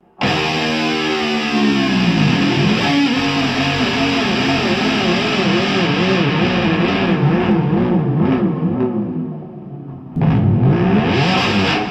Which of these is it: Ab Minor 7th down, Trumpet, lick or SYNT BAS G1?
lick